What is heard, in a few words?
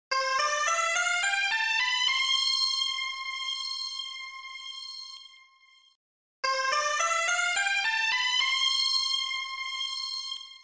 Speaker Check; Ridge Racer; Namco System 22; Arcade